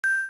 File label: Timer; LG L-01B